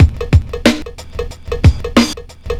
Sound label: LOOP; SoundFactory; DRUMLOOP